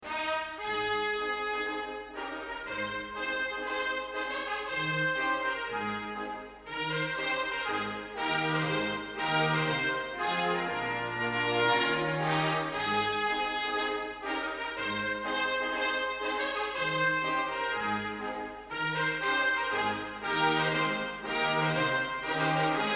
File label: Aida Trompettes; VERDI; romantisme; opera